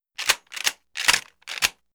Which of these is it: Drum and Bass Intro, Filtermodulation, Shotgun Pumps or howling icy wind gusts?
Shotgun Pumps